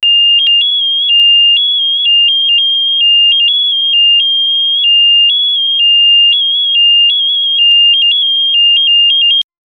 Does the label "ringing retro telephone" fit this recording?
Yes